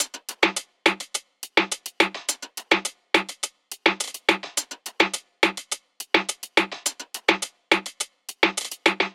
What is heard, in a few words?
Pop Percussion Loop - 105 BPM; Drums; Loops; Top; Cymatics; EDM Starter Pack